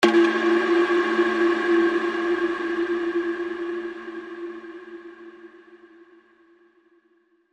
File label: Hushed Atonal Ping